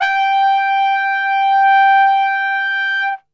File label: G 2, Brass; Best Service; Brass Super Section